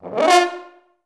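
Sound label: french horn F3; Best Service; Peter Siedlaczek's Advanced Orchestra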